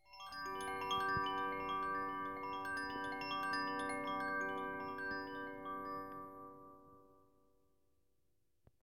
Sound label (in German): Koshi Feuer